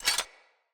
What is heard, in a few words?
Foley Action Metallic Punch; Arm